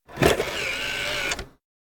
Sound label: CD or DVD ejecting disc from player